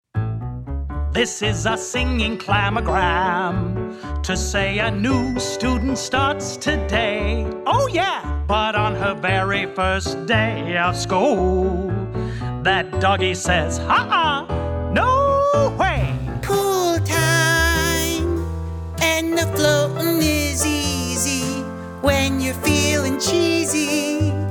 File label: Character Singing